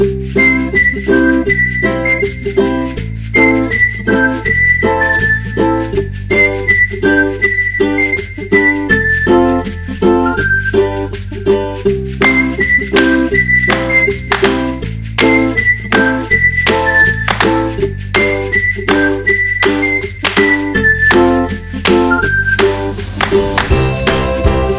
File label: Happy Fun, happy music